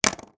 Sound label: technology usb flash drive put down on table